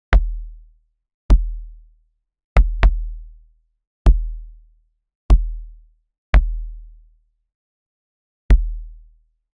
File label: quick playful drum hits, percussive